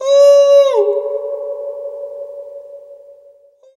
Ghost Cry Supernatural, Long Tail

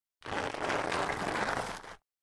Object Dragged Acros, rocks & dirt

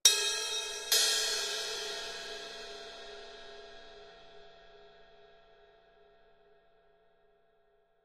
Cymbal Accent Hit, drums cymbal

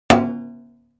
Aluminum Gutters

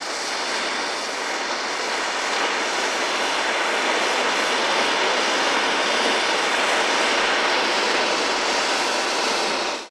Acetylene Torch, Long Textured

